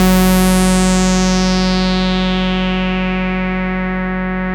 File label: MC 202; Masterbits; Vintage Voltage